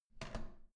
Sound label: door handle down